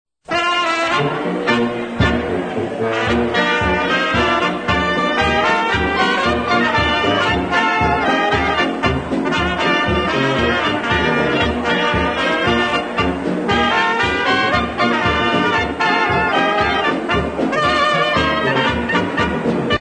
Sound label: POLKA, Orchestra